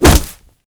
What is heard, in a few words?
blunt hit high; Mount&Blade Warband